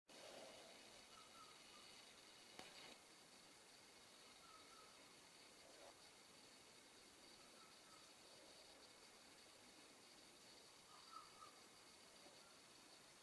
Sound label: night bird